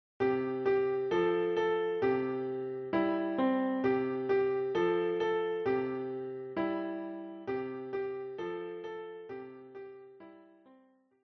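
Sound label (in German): Backe backe Kuchen Klavier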